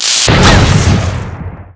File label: electric explosion, labs; hl2